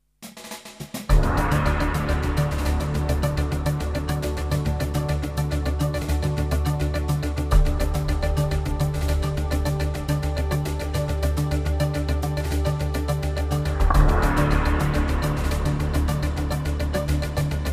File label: Jingles; 90 DDD Jingles